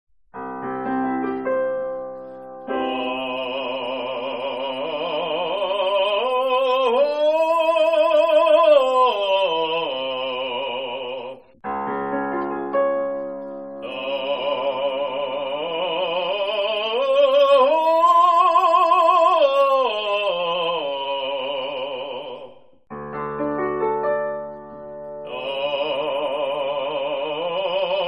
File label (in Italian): vocalizzi